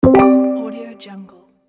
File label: sound notification